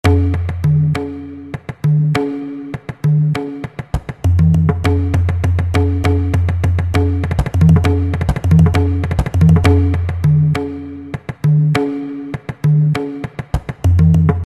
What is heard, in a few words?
pakhawaj